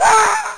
die; ARRAKIS

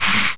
Hiss

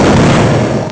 EXPL

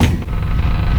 train2

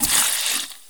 LAND

